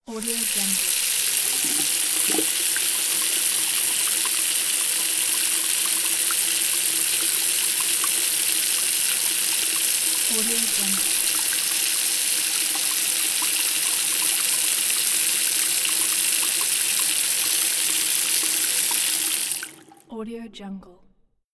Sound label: the tap water